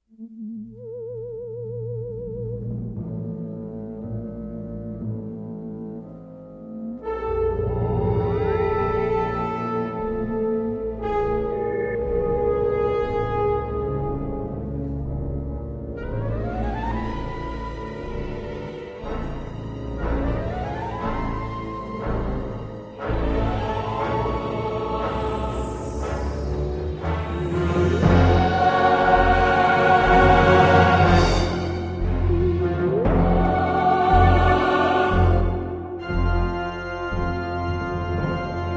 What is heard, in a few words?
martenot